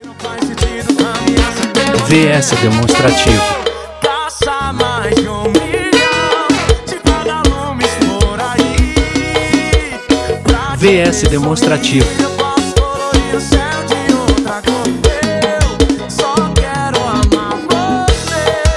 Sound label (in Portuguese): forro